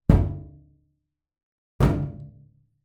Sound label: impact metal boiler panel